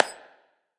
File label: clap; smite; poseidon